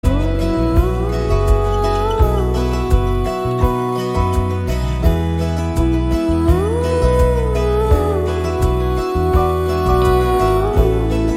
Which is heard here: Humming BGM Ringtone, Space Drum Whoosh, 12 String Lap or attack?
Humming BGM Ringtone